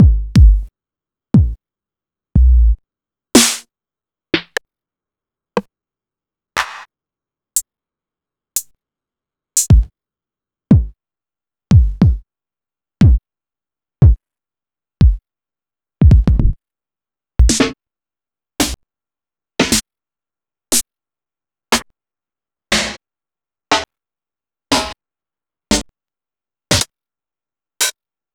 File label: EMU XL-70